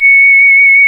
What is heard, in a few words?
C#, Synclav FM, WIND; Big Fish Audio